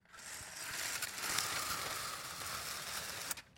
zvuk zhaliuzi

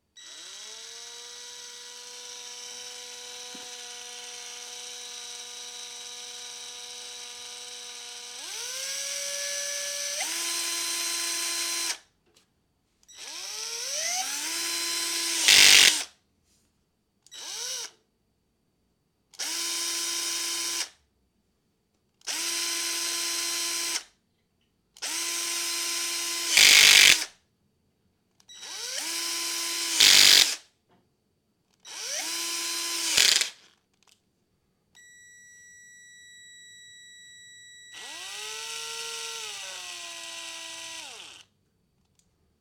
shurupovert, zvuk shurupoverta

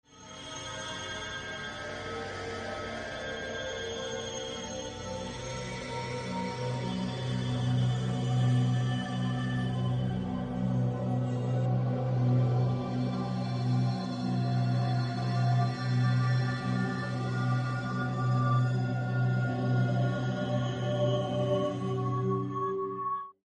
magich melod dlya fei

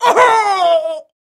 bol pered smertu

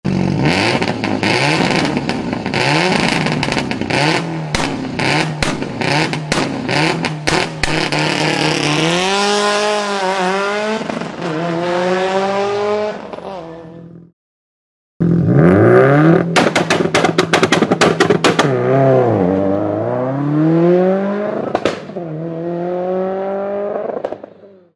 vyhl subaru impreza

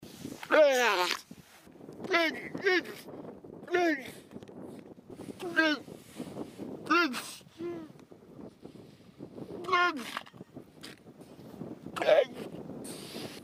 golos morskogo kotika

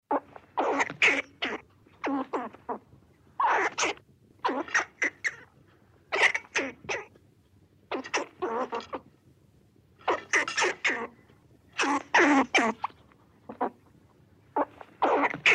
belka; звуки белок

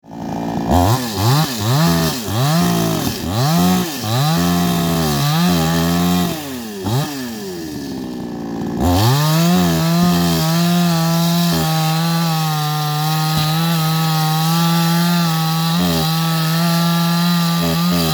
benzpila

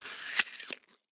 zvuk ukusa frukta

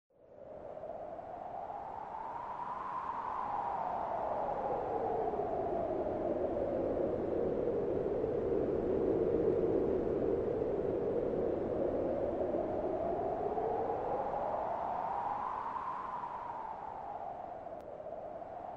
zvuk legkogo vetra

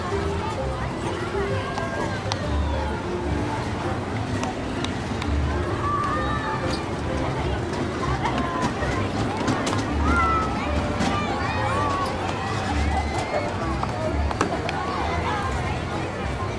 zvuk karnavala

